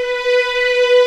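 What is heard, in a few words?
B4 legato, violins; East West; The Ultimate String Collection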